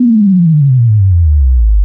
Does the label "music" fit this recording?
No